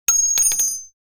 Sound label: cart